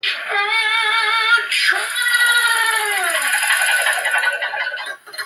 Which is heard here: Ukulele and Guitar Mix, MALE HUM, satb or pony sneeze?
pony sneeze